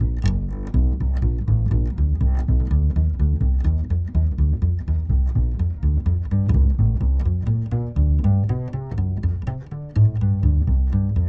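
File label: Trad Jazz Bass, Bbmaj, 123 bpm; Pro Tools Essential Loops; Digidesign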